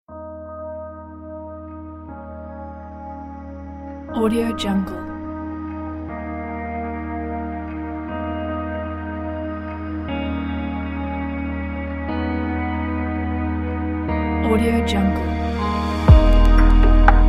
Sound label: slow ambient electronic